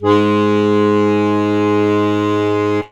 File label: HOHNER, akkordeon